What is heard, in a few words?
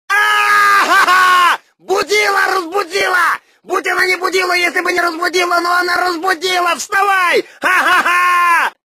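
sound for alarm clock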